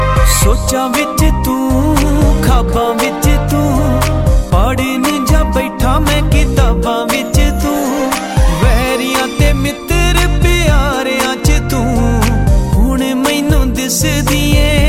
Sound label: Punjabi Slow